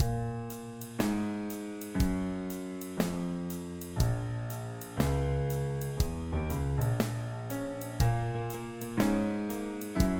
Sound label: Backing Track; a song about pattern